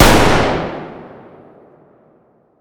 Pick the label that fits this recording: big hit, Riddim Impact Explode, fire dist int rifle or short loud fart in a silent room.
fire dist int rifle